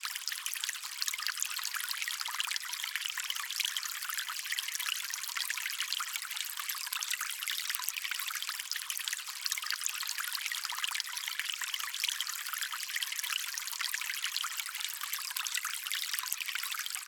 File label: nature stream water trickleing beach